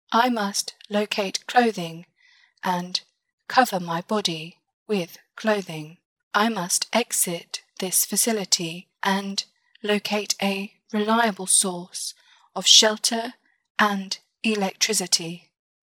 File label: Flanger; robot voice effects; Aural